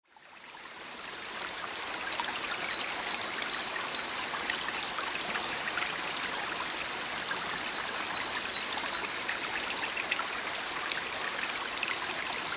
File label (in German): BACH Oberstaufen; Geraeusche